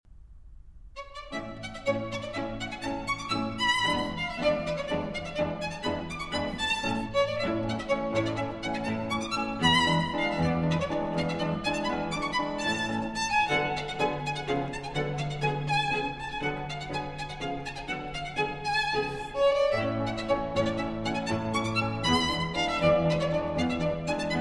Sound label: Quartet in A Major, Minuetto Scherzo, Allegretto